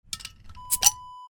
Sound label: beer bottle open